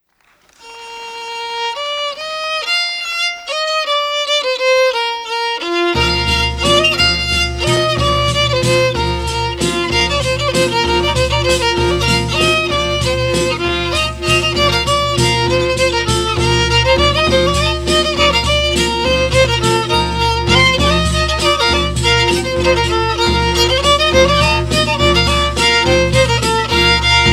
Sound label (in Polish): Kapela oberek